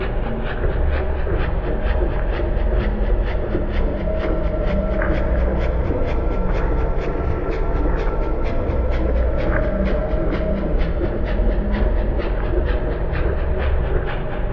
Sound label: train song, soundtrack; 2kki